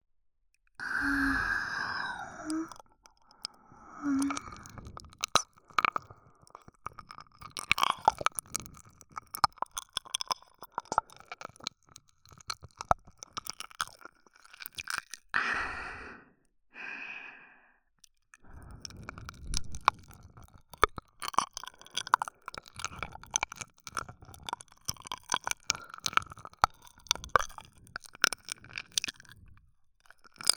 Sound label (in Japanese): 耳舐め愛情表現ASMR